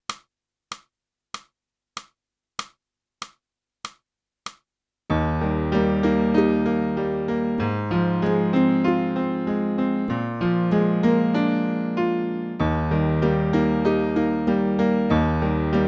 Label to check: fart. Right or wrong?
Wrong